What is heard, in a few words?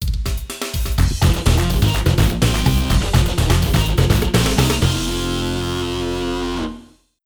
Draw; Final Round; PS1